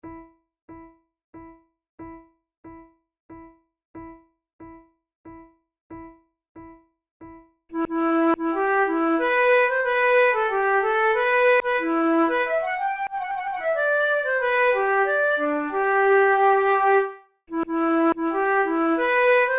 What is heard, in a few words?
two flutes courante a la francaise; Monteclair; 6 concerts; flute tunes